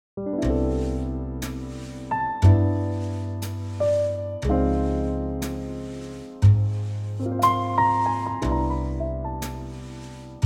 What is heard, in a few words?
C trio